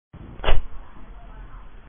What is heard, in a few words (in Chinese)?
受击; BattleCry